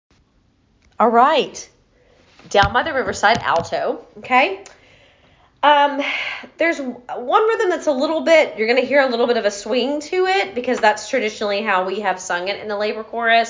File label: Down By Alto